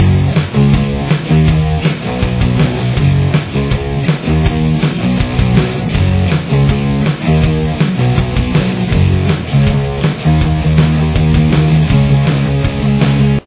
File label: chorus, guitar